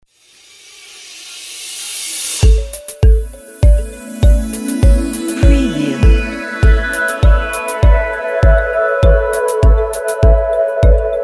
glossy logo

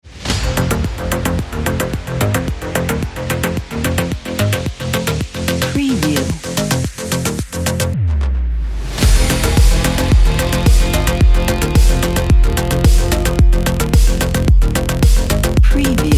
sport electronica

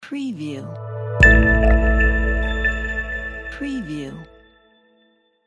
minimal logo

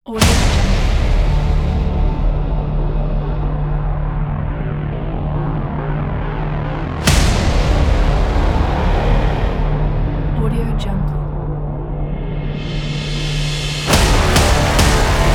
aggressive trailer intro ident

